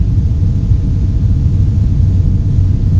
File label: gravgen